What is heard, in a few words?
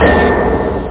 Metal Clang; NEMESES